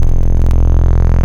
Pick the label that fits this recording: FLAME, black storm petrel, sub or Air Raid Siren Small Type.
sub